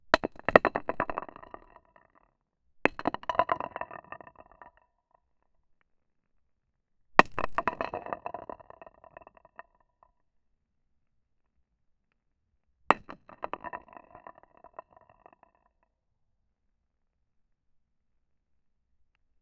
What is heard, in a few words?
loud booming crack of, rocks